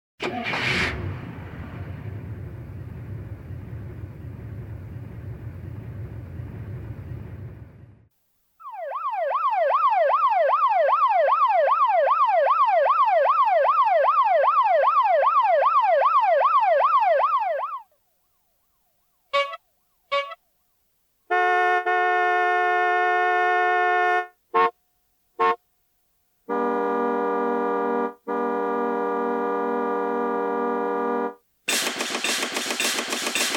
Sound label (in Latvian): pilsetas skanas